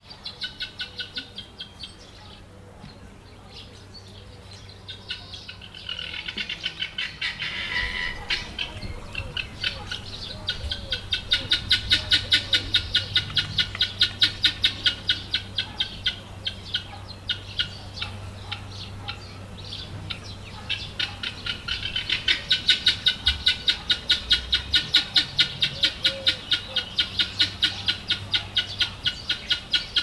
távolban, gyöngytyúk; Tiszavalk

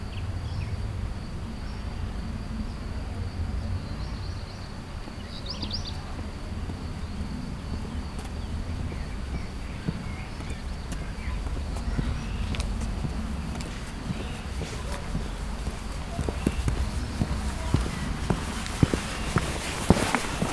jobbról balra az aszfalton egy bakancsos, gyalogos; Szögliget

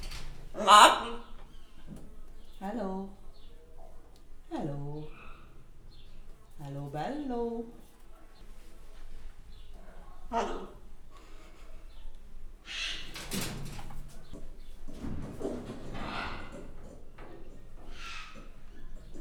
később visszafogottan, kék sárga ara papagáj; Győri Zoo 2014

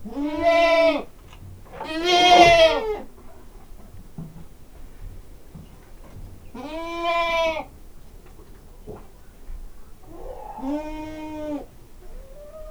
kecske mekegnek tyúk; állathangok; paraszt udvar; házi kecske

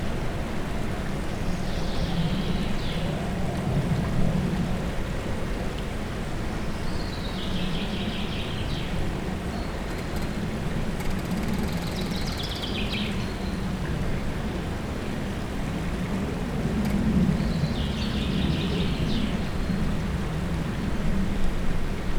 nagyon halk nyikorgás repülő; Ménes víztározó után; fanyikorgás

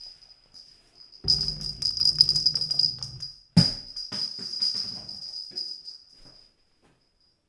gurítás balról földre leesik, csörgőlabda; Perkupa; játék asztalon gurítás

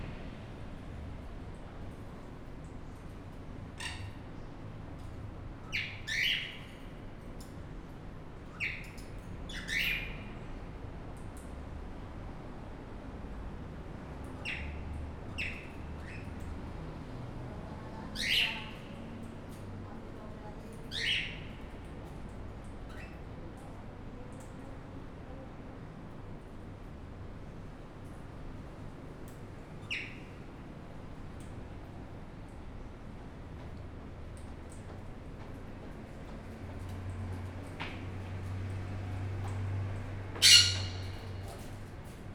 nimfapapagáj, Arrecife; lanzarote 2017; allathangok

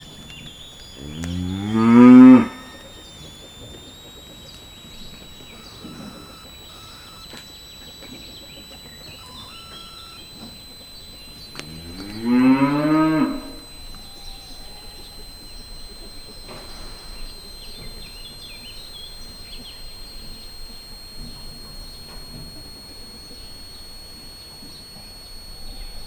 két bőgés bal oldalt sípoló zúgás, magyar tarka szarvasmarha; miskolc zoo

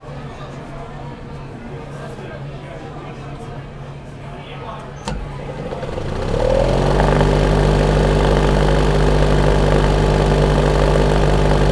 film nélküli indítás leállítás, mozivetítőgép; szimplakert

